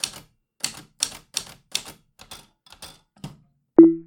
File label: typewriter fast